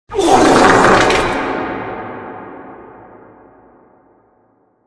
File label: wet fart with reverb